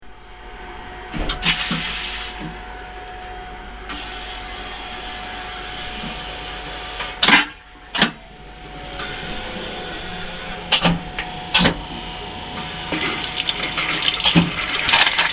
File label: machine and water